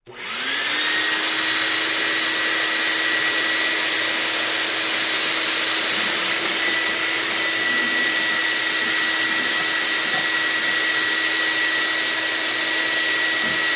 shop vacuum; Shop vacuum sucking up wood particles on table. Industrial, Vacuum Vacuum, Industrial Motor

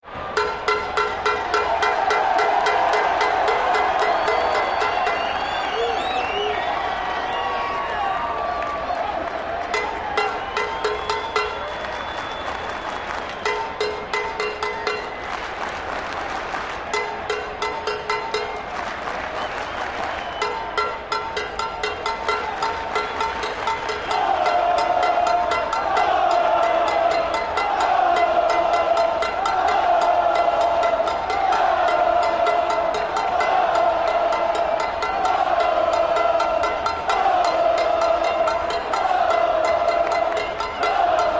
Arena Crowd Cowbell, sports arena

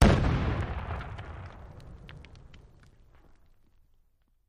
Bomb Explosions Sma, rock & sand

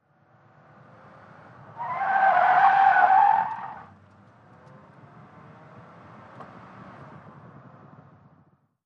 Sedan 180 Rev Skid Mx

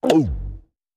Gigantic Monster G

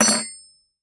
Silverware Droppin

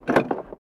Cessna Pedal Down, Firm

